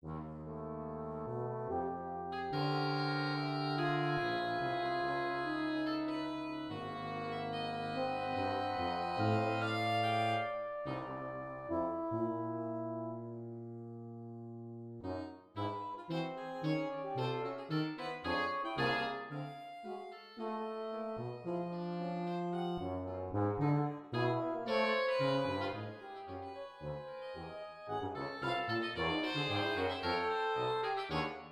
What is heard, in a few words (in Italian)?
Marcia Per Quintetto Di Fiati, MIDI; Clementi G